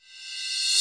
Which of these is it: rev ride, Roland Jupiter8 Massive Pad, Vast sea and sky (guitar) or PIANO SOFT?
rev ride